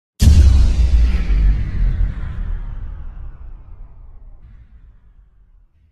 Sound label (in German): stromausfall kraftwerk; minecraft